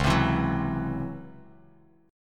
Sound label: C sharp Minor Major 11th, Bass Standard; strummed; 9,15,14,11; JGuitar